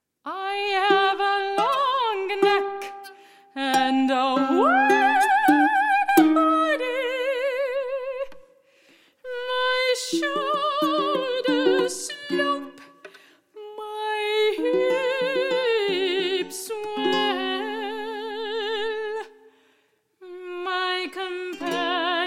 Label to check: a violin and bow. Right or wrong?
Right